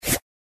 SFX Interface Change

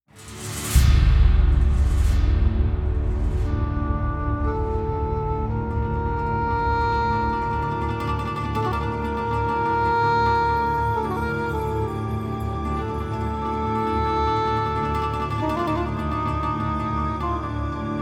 Mideast Defeat; Empire Earth III; Rockstar New England; Vivendi Games

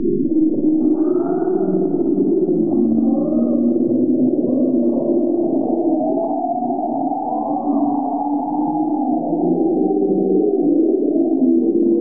Dead Souls Canyon Ambient; Fallen Lords Condemnation; windows

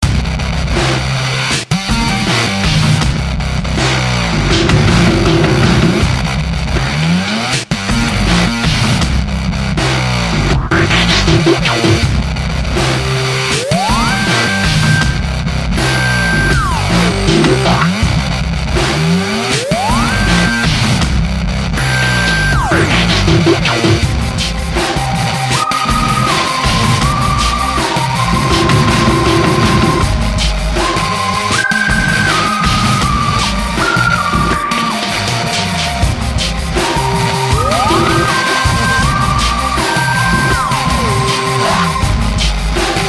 Dubstep; Astlibra Revision; Windows; gamerip 2022; My First Dubstep

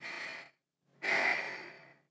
Sigh; talking tom cat 2 android ios online gamerip 2011

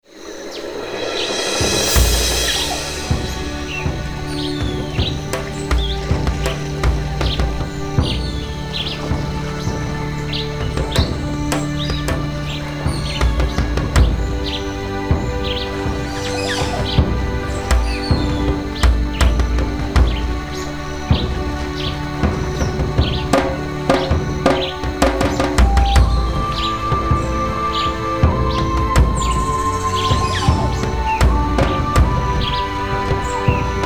Peace Relax; Serious Sam 2; Mdigbo Village

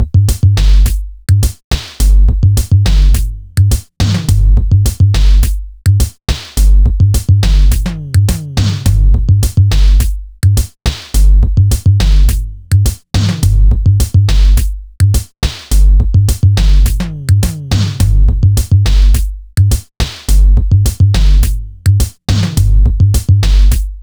Menu Music; TV Kid; Windows

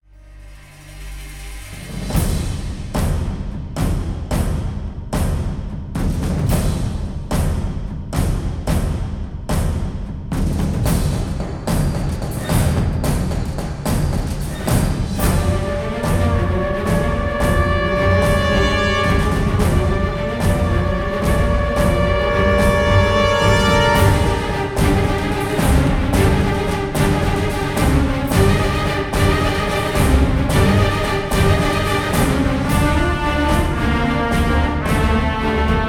Fight Theme Frantic Fast Start; ryse son of rome 2014

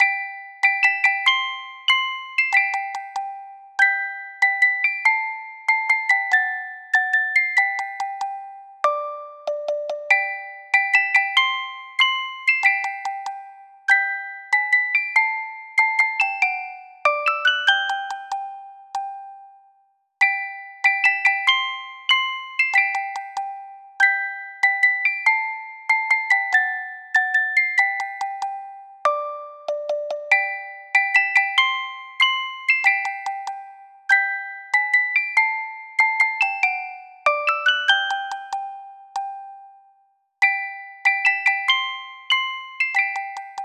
March, Music Box; animal crossing new leaf welcome aamiibo soundtrack

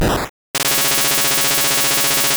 Aeroblast; sfx; attack moves; pokemon; gen 2; gsc

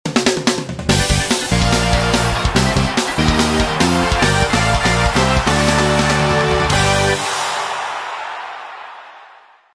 Battle Opening; Kirby Battle Royale

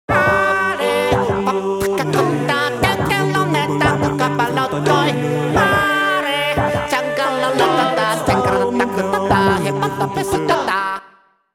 Acapella Version

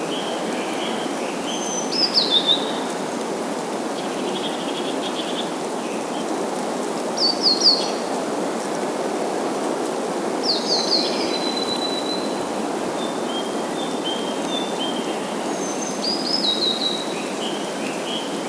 Forest Birds Trees Loopable

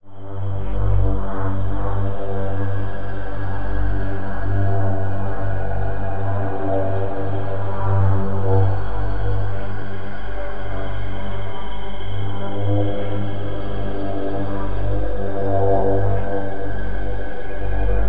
Suspense; Mass Effect 2; Starport

